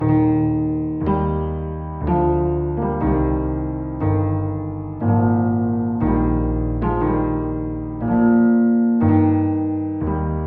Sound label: minuet; crawshaw; macklenburg